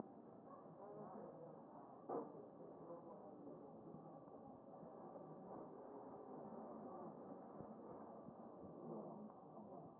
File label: A CHEST